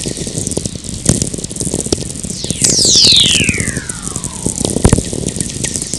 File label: whistler